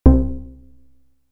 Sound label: Three Pulses, synthlead